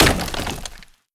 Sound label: break ceiling tile; physics; breaking